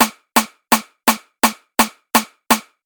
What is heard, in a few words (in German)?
sos click